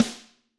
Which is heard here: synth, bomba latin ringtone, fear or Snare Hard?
Snare Hard